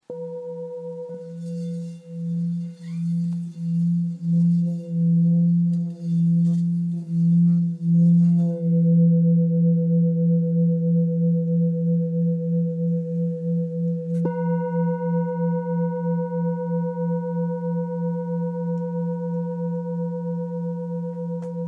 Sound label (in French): bol bengale Mi